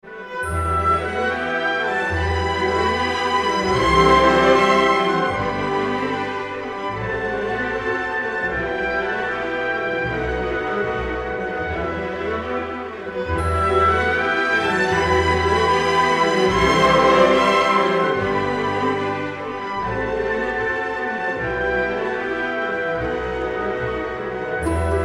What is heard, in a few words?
classical music tone